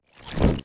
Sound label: Battery Plate Light-Off